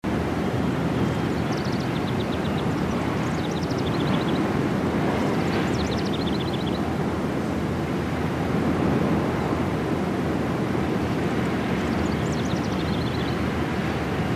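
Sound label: Ambience Castle Exterior Draft Loop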